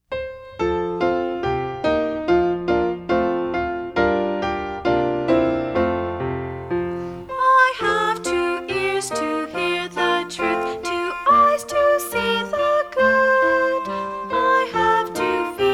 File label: words and music